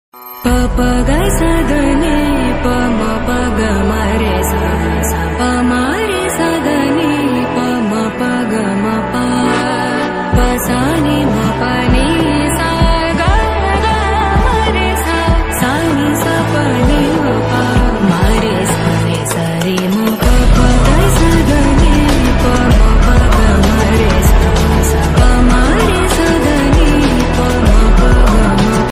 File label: love bgm ringtone; Doctor movie